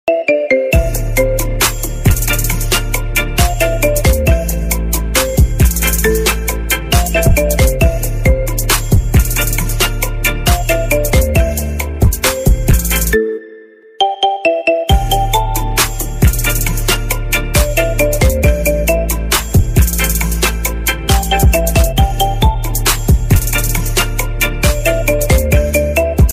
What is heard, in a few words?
iPhone 11 Pro Remix Ringtone; ringtones